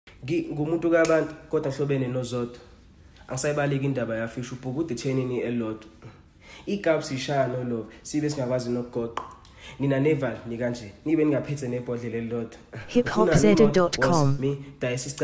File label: Acapella Freestyle